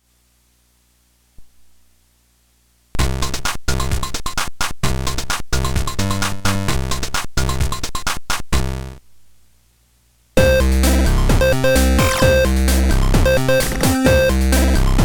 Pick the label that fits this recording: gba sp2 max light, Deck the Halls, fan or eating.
gba sp2 max light